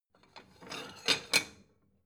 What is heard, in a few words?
plate small take slide soft